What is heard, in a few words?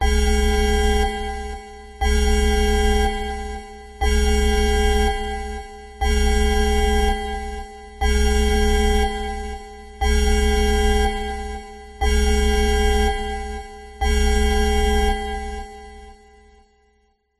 emergency nuclear power station meltdown alarm